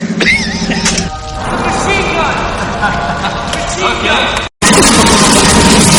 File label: german song